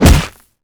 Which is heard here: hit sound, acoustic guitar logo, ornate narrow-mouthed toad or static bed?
hit sound